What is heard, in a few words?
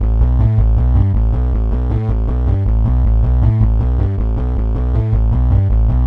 Hardcore Breakbeat, 158 Amin; Big Fish Audio - Hardcore Breakbeat Action; Hardcore Breakbeat Action CD1